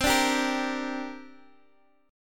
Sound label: C 7th Suspended 2nd, Guitar, DADGAD